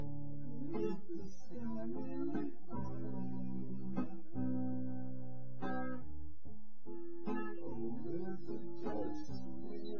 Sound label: nodrums synthconv